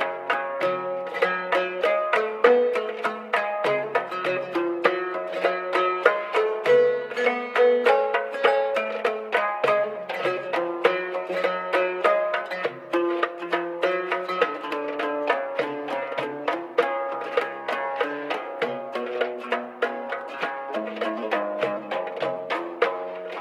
radio music

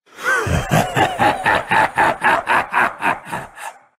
dead, grunt sounds

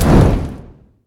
flame; svencoop; ragemap2022

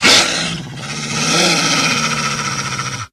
pdog aggression, pseudodog; russian; sven; russian sounds

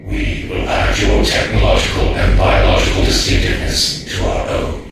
alert; borg cube

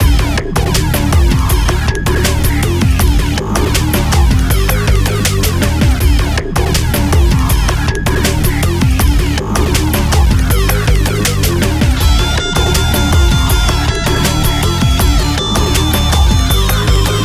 neo rock

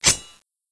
dragonclaw draw; cso

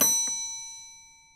bell, button; sven; ragemap2022; trempler

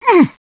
pain; bts rc; construction

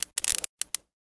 tesla draw, weapons; hunger

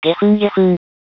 pain

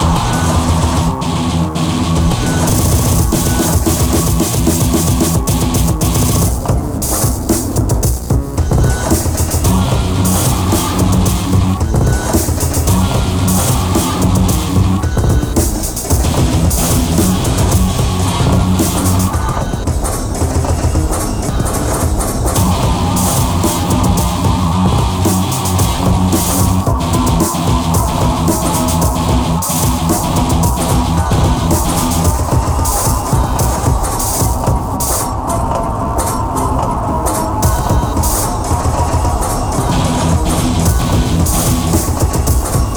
loop; svencoop

